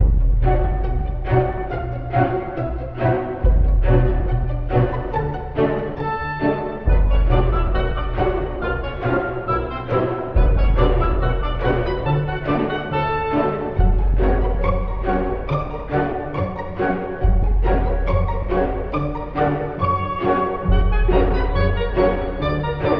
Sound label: horns and strings, phonky loops